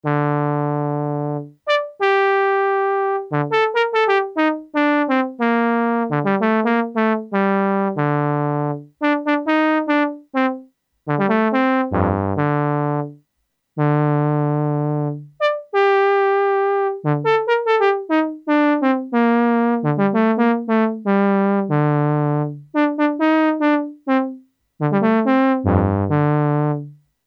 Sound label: Roland JU06 vs Roland Juno106